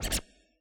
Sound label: scratch push; AnlgKit1